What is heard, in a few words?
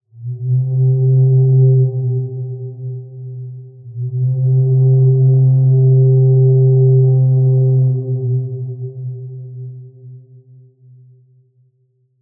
fog horn; docks; soundscapes